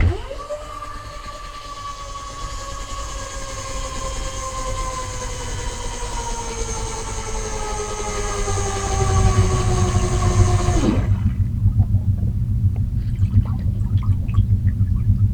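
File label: long motor, Underwater Drill; SFX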